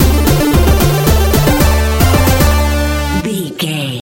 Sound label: retro 8 bit game level completed